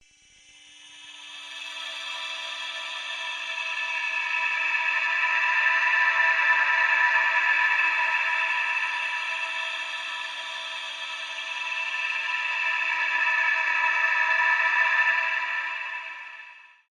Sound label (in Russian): misticheskaya atmosfera